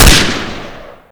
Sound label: m2 carbine; garrysmod